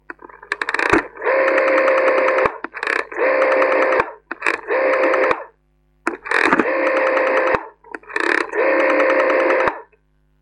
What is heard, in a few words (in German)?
waehlscheibentelefon; Ausstellung „hot stuff“ des Archäologischen Museum Hamburg; Sonderausstellung im Archäologischen Museum Hamburg